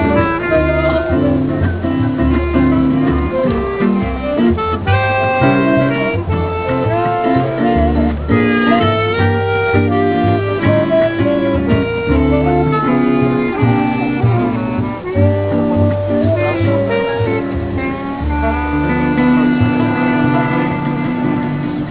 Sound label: charles br violinist; Prague; CharlesBridge; Nite